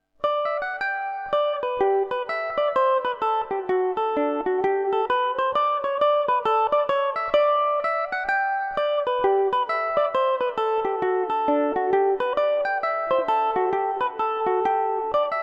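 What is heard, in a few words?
G major revelation, Irish mandolin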